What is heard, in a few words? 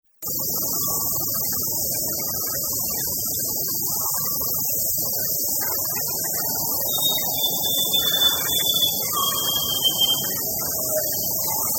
Rainforest Cicadas; Nature Scenes